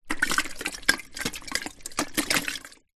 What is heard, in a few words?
household kettle water movements